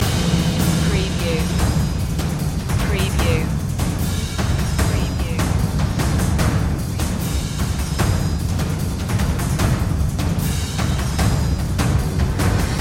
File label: the dark hero percussion loop